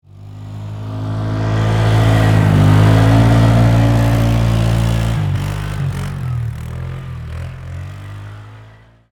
ATV Pass By, Sports, Atv, Pass, By, Close, Medium, Speed, Polaris, 500Cc, Four, Wheeler; BlastWave